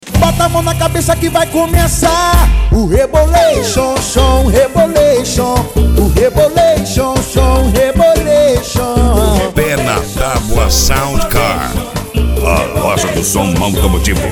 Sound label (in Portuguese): sound car carnaval